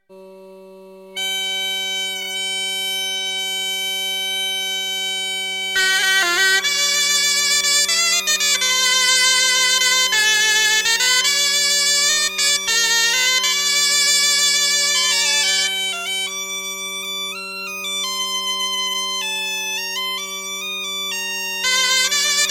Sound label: Plinn Bal; Bro Dreger